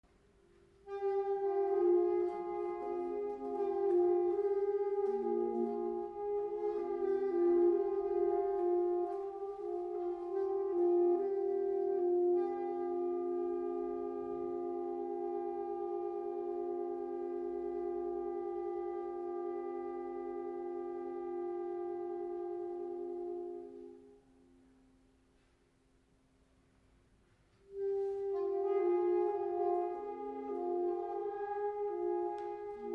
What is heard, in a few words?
pianissimo